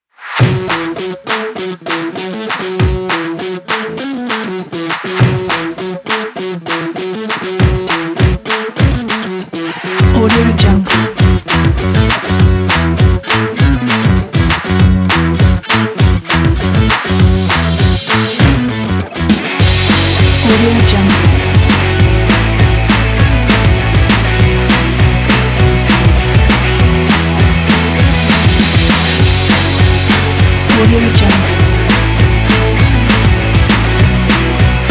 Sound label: upbeat rock kit